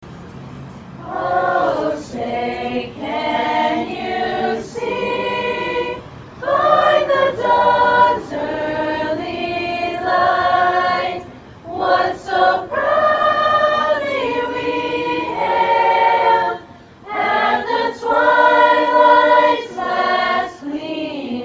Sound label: Choir National Anthem; Smethport HS